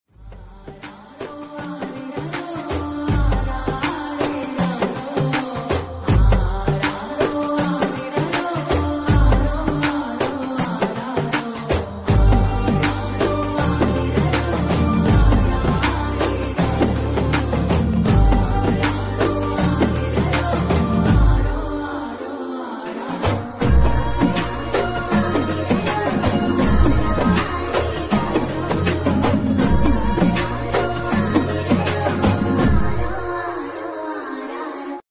love bgm ringtone; suriya kanguva movie bgm; new south love bgm ringtone

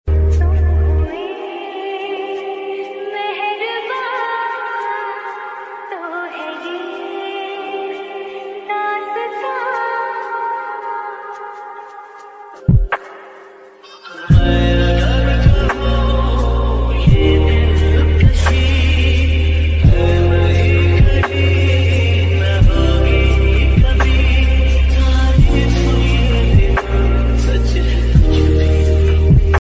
lofi ringtone lofi remix ringtone lofi bgm

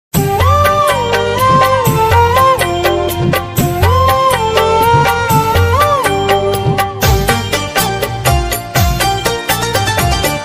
instrumental ringtone bgm sound background music; छठ पज

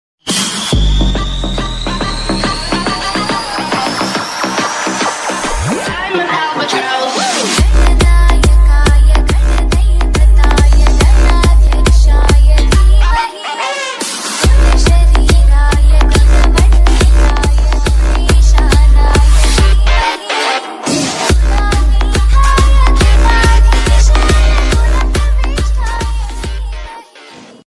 Tamil bgm

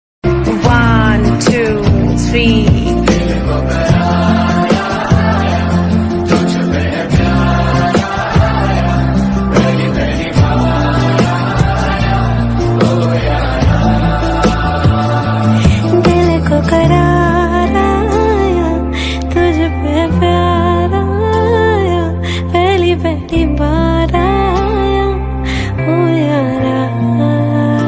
south bgm ringtone tamil ringtone telugu ringtone

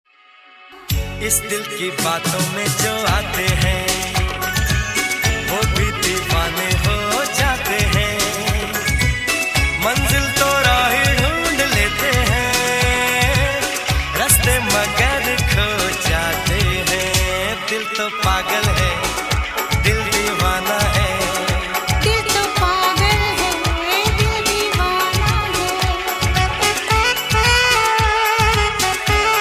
love ringtone; hindi

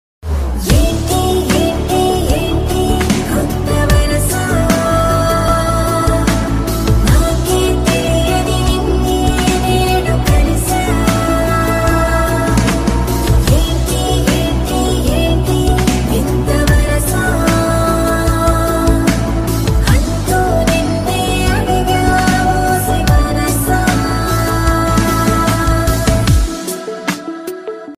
ringtone; geeta govindam

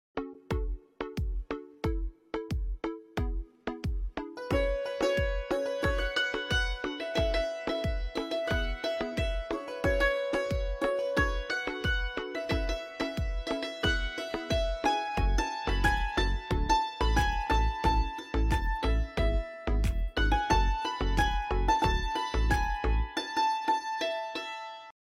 instrumental cover cimbaly dulcimer cover

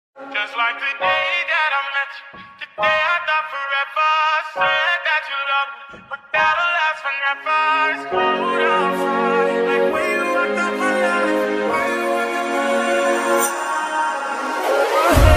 ringtone

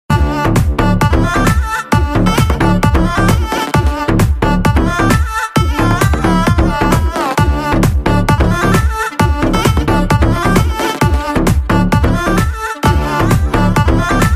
bgm ringtone; pushpa 2

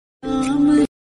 bhakti song ringtone krishna bhajan

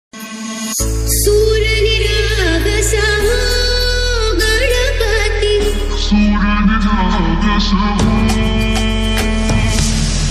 ganpati bgm ringtone, ganesh bhakti ringtone

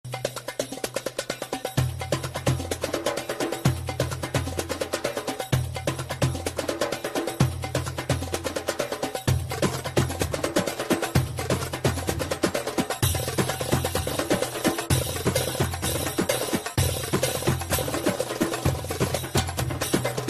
music ringtone arabic ringtones

